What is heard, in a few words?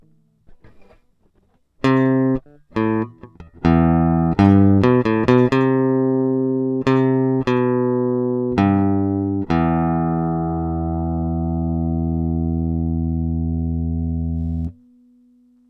Elect Guitar